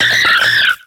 Grito de Minun

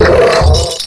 Grito de Ferrothorn

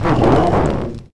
Grito de Pignite

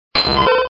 Grito de Poliwag

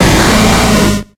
Grito de Weezing

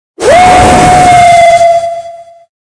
Grito; Zacian

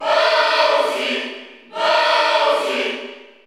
Grito del público (Español PAL); Bowser Jr.; SSBU

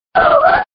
Grito de Absol

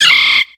Grito de Shroomish

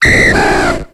Grito de Dragonair

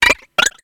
Grito

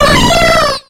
Grito de Jumpluff

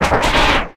Grito de Treecko

